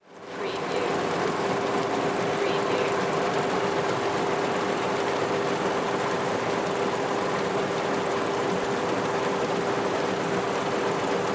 Conveyer Belt Movement

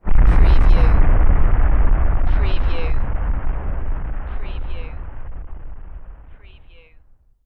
Explosion Huge Distant; Action, Impacts & Explosions

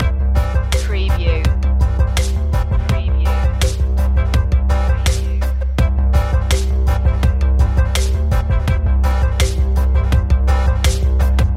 deep bass loop

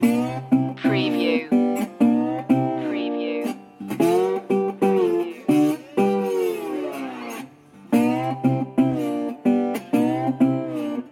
star spangled blues loop

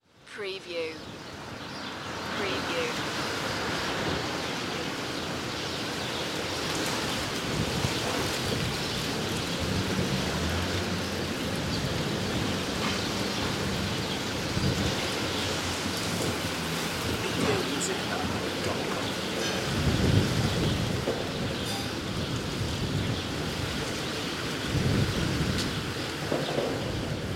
Windy Rural Ambience

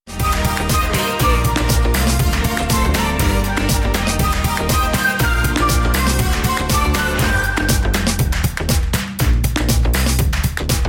latin groove; media music now

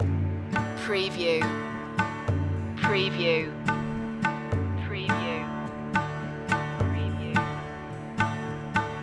bombay blues loop

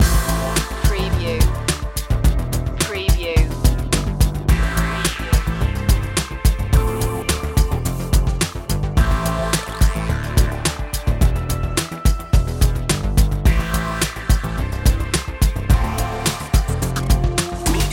loop, chill

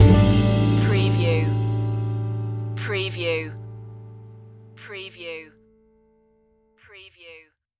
think casual stinger

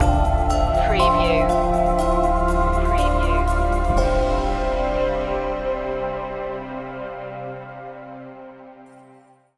reflection bells stinger

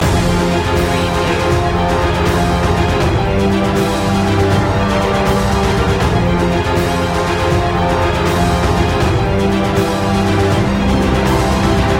land loop

